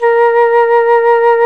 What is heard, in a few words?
FLT FLUTE, Flt vib; Roland L-CDX-03 Disk 1